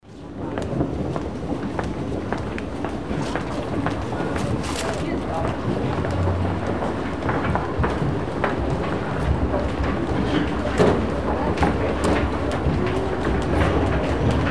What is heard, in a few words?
railway station footsteps